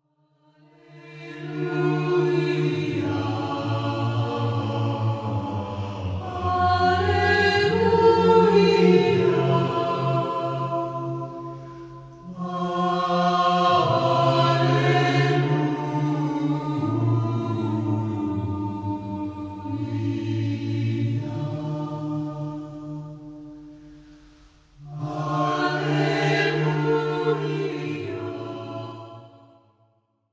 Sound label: Russian Alleluia